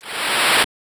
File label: Spray